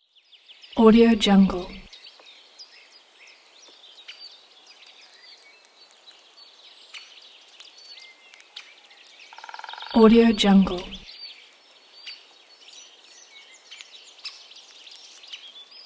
nature ambience birds forest light rain woodpecker